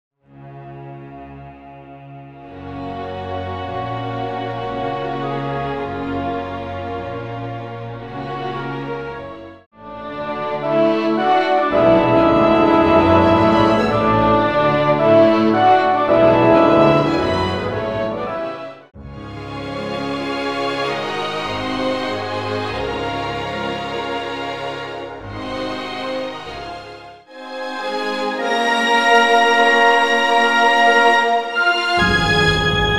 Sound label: Trio, Full Orch accomp; Madama Butterfly